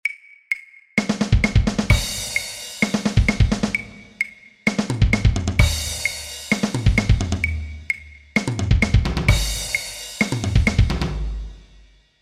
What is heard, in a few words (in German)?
einfache drum fills schnell